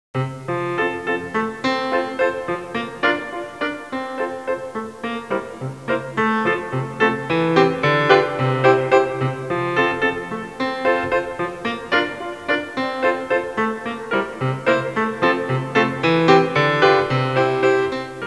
suonerie classica; musica classical

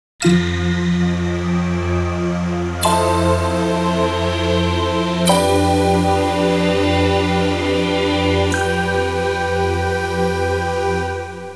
musica tecno; techno

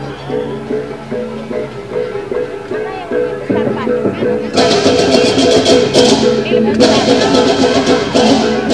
musica strumentale; instrumental

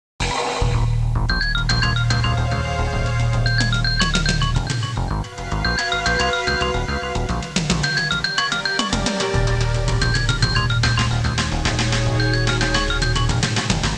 musica elettronica

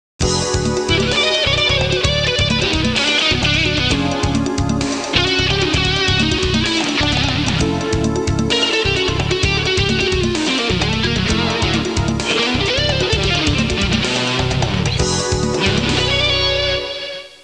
suonerie musica jazz

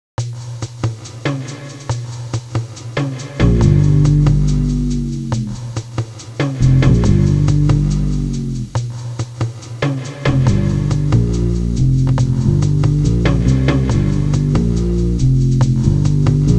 suonerie musica ritmica

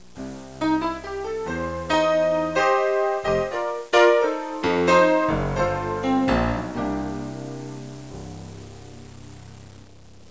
musica newage

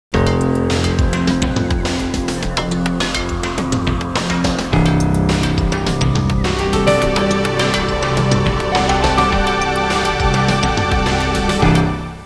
musica tecno; techno

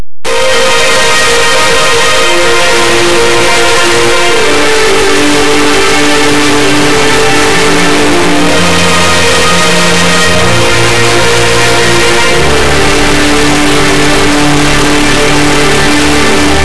musica newage

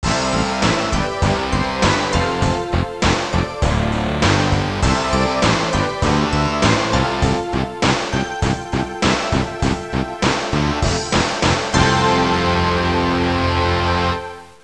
suonerie musica rock

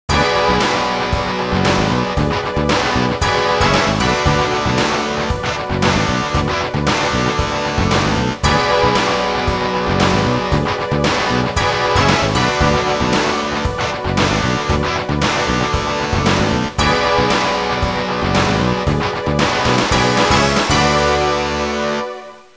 suonerie musica rock

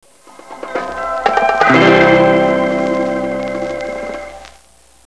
suonerie musica ritmica; rhythm

